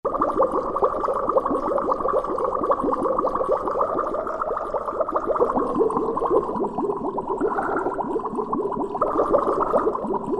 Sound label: 3D BUBBLE, Roland SC-880; Factory Patches